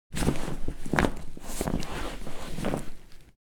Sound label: Pack clothes in suitcase